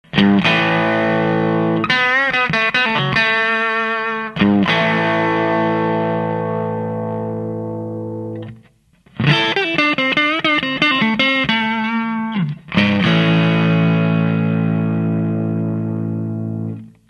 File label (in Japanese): EF OFF SOUND, 前半はストラトキャスターのリアPU 後半はフロントPUです